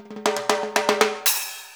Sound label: FILL, Percussion; InVision Interactive; Percussion Slam